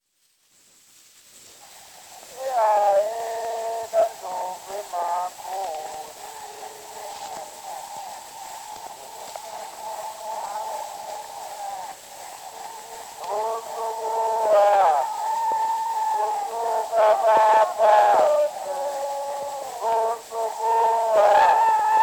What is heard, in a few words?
Zulu Recordings; Father Franz Mayr; Ihubo lomkosi